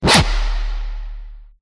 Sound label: monster kill